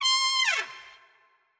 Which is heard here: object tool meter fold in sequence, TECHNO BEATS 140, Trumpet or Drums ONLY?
Trumpet